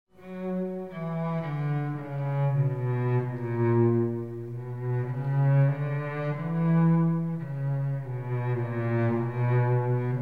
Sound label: cello